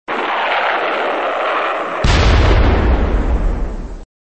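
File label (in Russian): fired charge explodes in the distance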